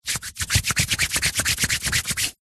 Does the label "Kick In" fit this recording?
No